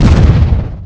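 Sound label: large explosion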